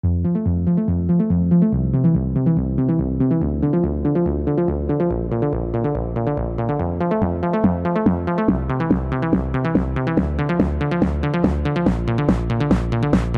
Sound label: galaxy ring